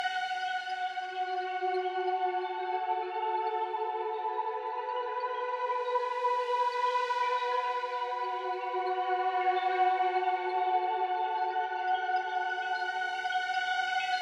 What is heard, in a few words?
135BPM Atmo pad, F# minor; Melody & Synth